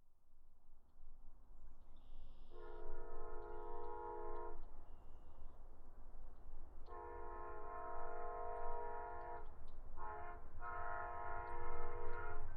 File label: Train Passenger By Fast Distant Horn; VIA; Distant Horn At Start; Trains; Vehicles